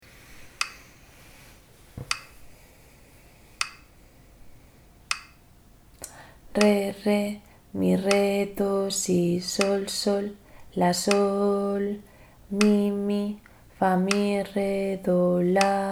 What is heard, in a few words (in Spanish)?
ritmo 6-4